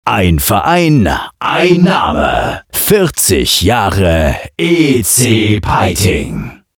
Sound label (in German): chorus